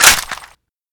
jackhammer mag tap; weapons

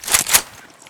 aug bolt pull; fnscarh; weapons

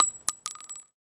shell, g2contender; weapons

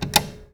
press, atm

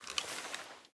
shift, sig p228

